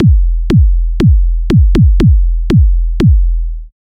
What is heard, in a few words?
basic kickdrum 120 bpm